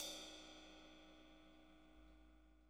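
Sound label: CYMBAL, JAZZDRUM; Ueberschall; Drum & Bass Flash Trackz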